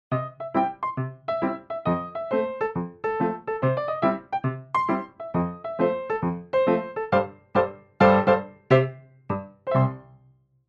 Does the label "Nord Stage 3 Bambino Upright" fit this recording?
Yes